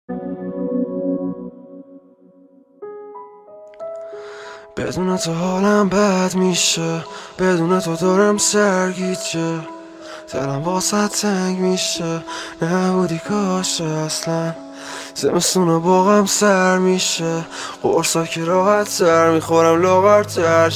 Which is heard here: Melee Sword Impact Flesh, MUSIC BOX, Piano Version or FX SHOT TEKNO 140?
Piano Version